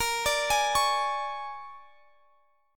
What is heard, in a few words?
Bb 7th Sharp 9th Bb down Guitar Half Step Down; arpeggiated; Bb7#9